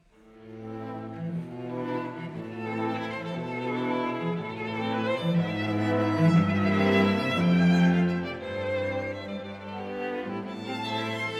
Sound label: String Quartet in A minor Allegro appassionato